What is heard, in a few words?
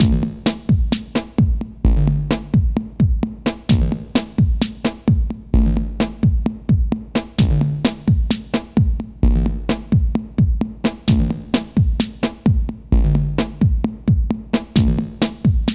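drum n bass, Funky Xtreams; INIS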